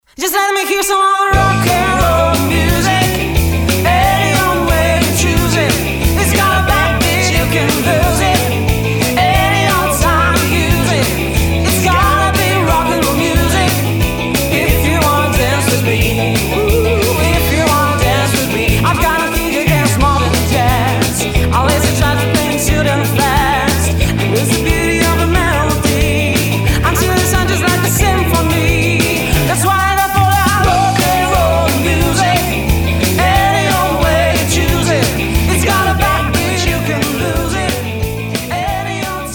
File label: rnroll music